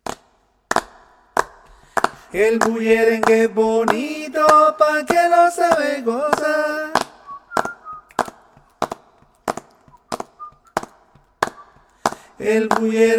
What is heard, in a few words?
el bullerengue alto